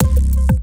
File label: Chunky Kick, Drums; BB 2HTC Samples Vol 4 Addendum